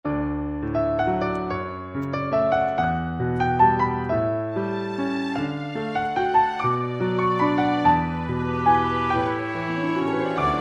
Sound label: soft piano